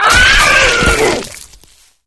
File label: flesh destroyed large; flood